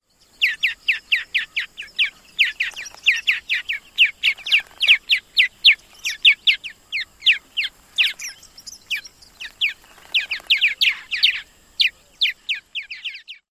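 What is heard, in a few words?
PURMAR chew calls, purple martin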